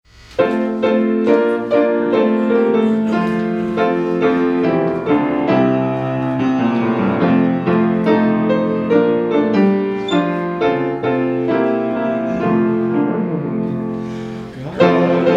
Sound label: Hymn; God Loves All His Many People